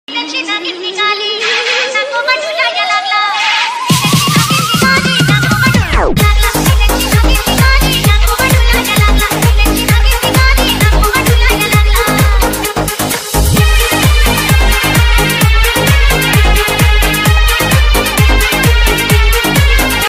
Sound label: special ringtone marathi ringtone; nagpanchmi